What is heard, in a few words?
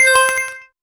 casual game player gains; casual games